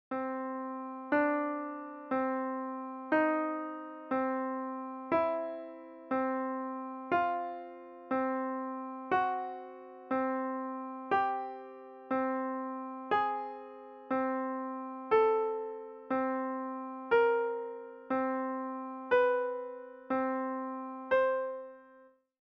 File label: intervals